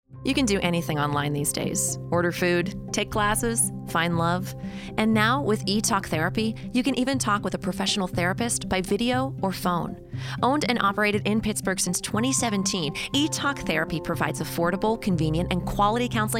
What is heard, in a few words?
Music Bed; E-Talk